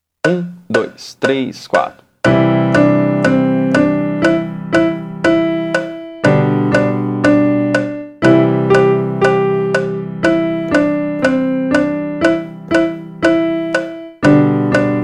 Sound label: Merrily we roll along 120 BPM